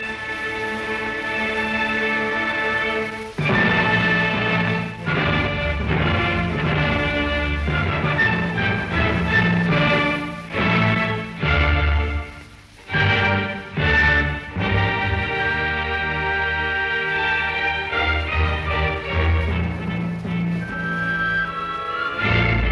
Symphony in D minor Choral Allegro ma non troppo un poco maestoso; Symphony No 9; Op 125; Arturo Toscanini